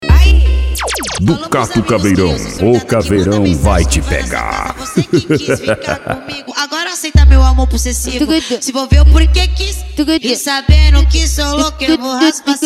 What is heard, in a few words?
funk mega bass